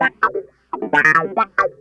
Clavinet; Ambient Grooves

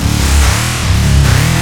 SEARCH LOOP, Big Beat; Big Beat Samples & More; AKAI; ALL LOOP KIT

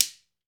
GHANA SHK; World Combo

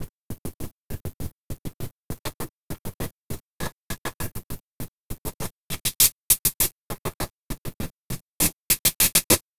Envelope Beat; Drums & Drones

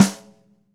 Ludwig Supraphonic Snare, One-Shots; Sonic Foundry (Sony Creative Software) - Vital Drums

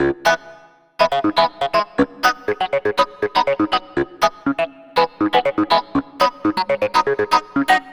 Clavinet; Dr. Fink's Funk Factory

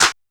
Hand Clap, Standard Kit, Yamaha PSR-E323

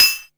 BALL PEEN

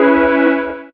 DR. LOOP; X-Static Goldmine 3; CHORDS

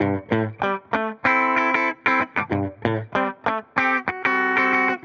Tele 95 A, Guitar, 95bpm

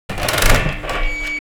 The Lever; Weird; Industrial

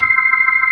hi fast C3, ToneWheel Organ; E-MU Formula 4000 Series Vol. 3; Analog Odyssey

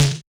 Yamaha DJX 2B, SD Hard

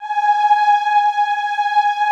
AHH G4, choir; Best Service; Extended Classical Choir; AHH MIX

